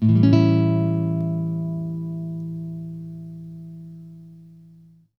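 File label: classical chord, guitar; Greytsounds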